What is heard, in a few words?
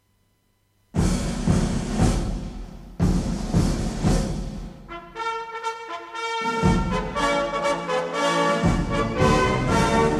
Regimental Band Ilkla Moor; Duke of Wellingtons